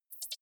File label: hover high, signale